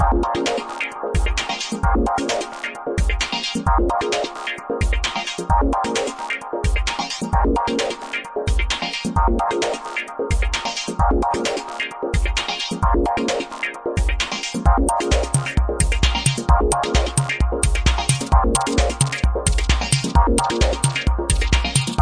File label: funky journey, rollertechno